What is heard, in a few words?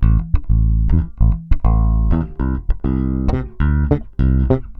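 bass A minor, bass baion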